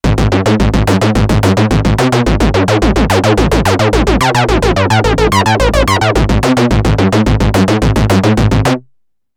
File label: novation peak angry sync; novation peak test